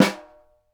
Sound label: snare, Roland; LA Composer